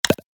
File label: Share button click; shorts sound effects